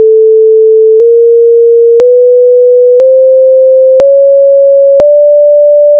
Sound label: all Notes